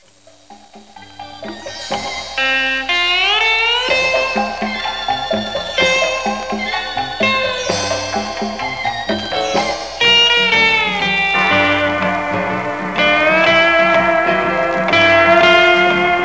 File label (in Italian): musica strumentale